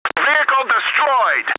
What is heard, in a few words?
death